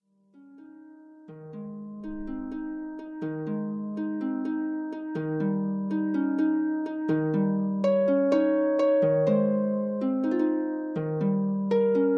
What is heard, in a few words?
Harp; Harp Music from Argentina; Criollos mvt 1